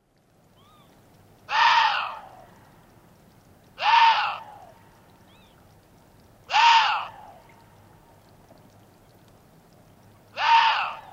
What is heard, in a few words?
Chinese water deer call